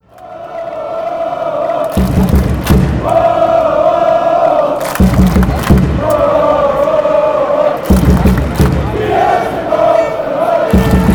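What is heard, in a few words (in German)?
Torhymne and Fangesänge; tsg 1899 hoffenheim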